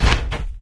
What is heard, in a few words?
dog footstep run; source engine; episodic